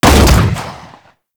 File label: Fire Shot; Weapons; Warframe; Strun